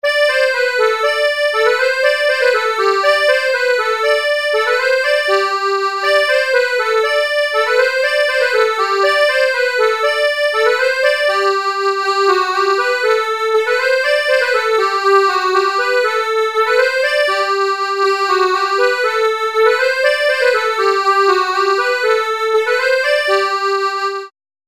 Bourrée; Porta chopina

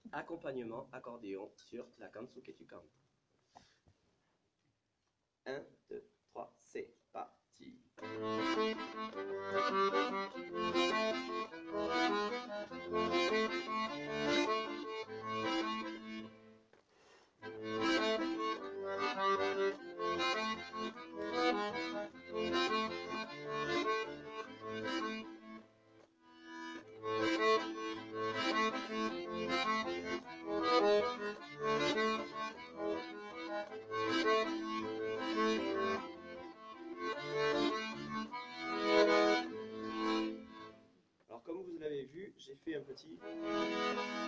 Valse accompt diato; La cancon

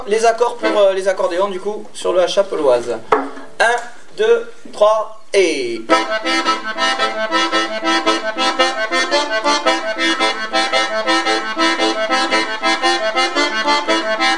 Accompagnement diatos; Chapelloise

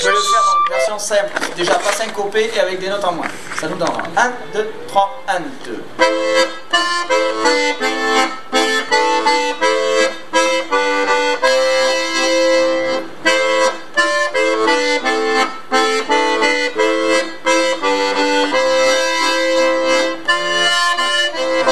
Voix 2 diatos version simple

